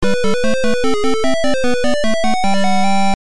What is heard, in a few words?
End of Level Sound; BurgerTime